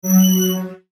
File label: Mouseover Hi-Tech Warp; Multimedia; Blastwave